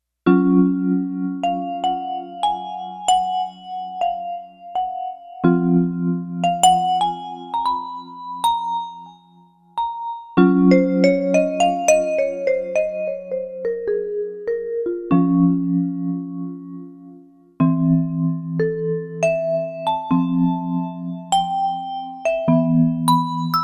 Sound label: Vibes